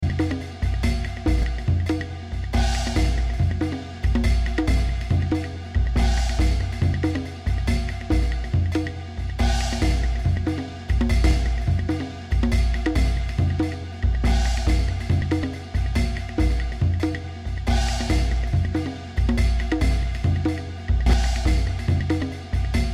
atmo asia instruments

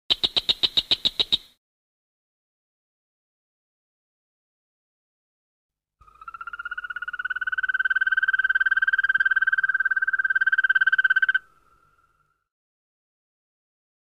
nature frogs

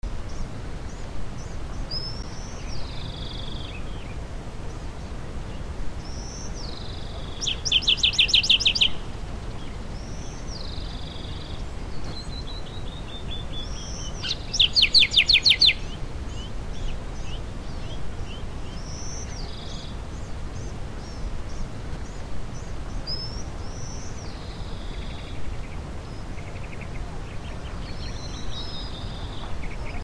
atmo arizona sedona palatki birds

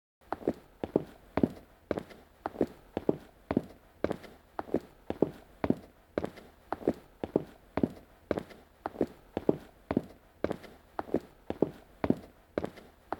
footsteps unisex linoleum

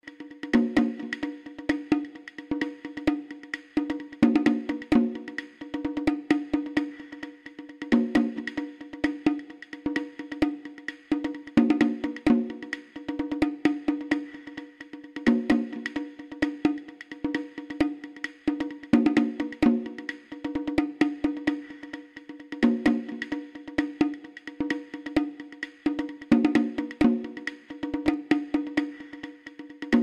atmo asia instruments

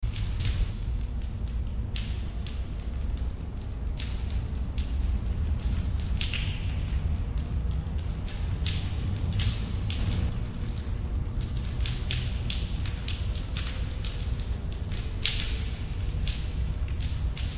atmo underworld cavern drips

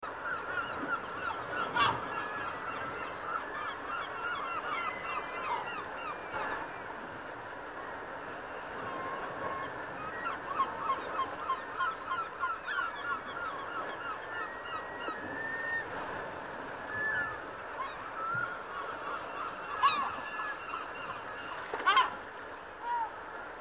atmo harbor marina seagulls

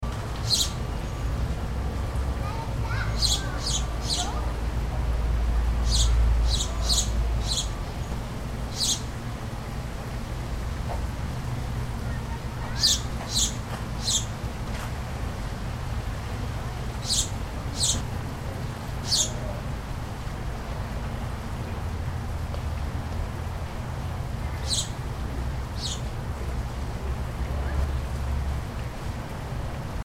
birds mission courtyard; ca sjc

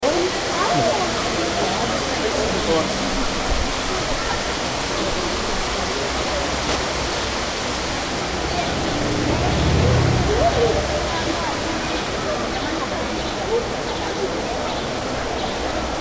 aquadom kids and fountain; germany berlin

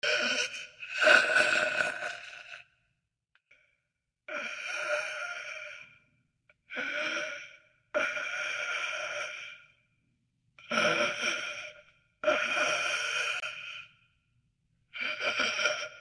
atmo dark breaths

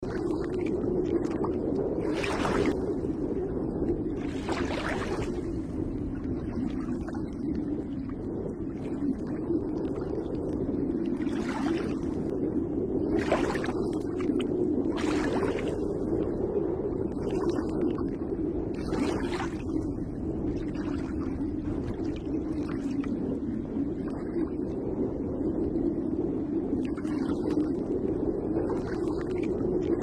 water wind and water movements

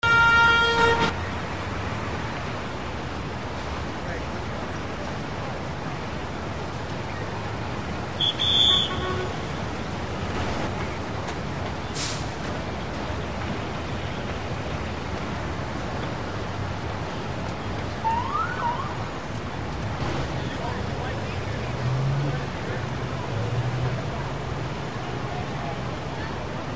traffic active intersection

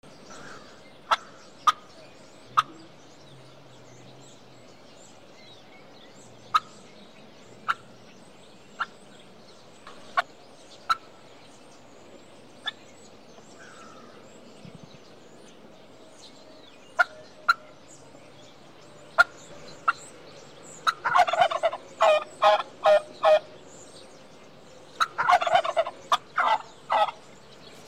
birds turkey

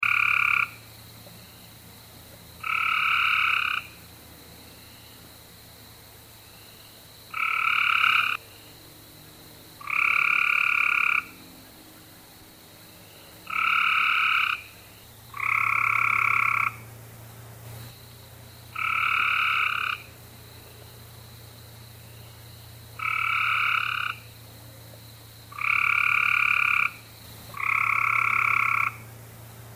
frogs carolina gray treefrog

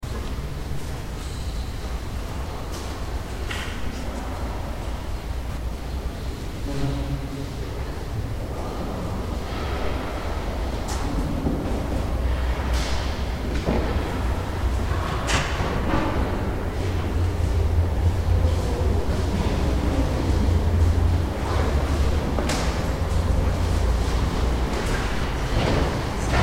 atmo germany berlin marienkirche interior

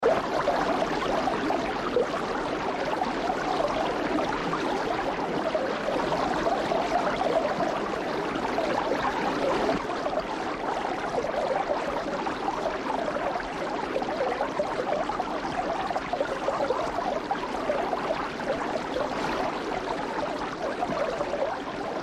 water mountain brook

